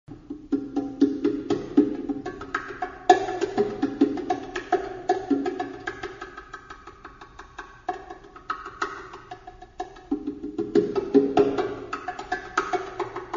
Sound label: Conga